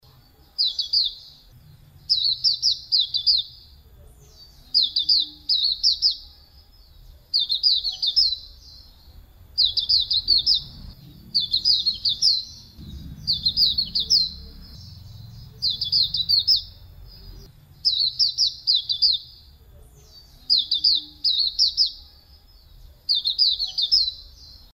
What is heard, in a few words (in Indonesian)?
suara pleci auri